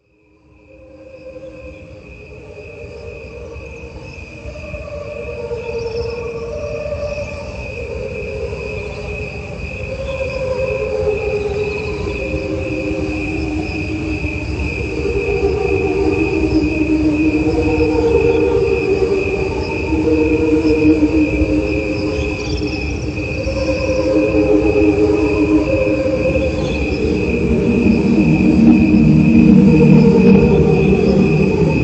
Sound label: Cricket Chorus, Meditation Music